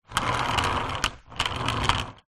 household vacuum cleaner suction nozzle on wheels roll on tiled floor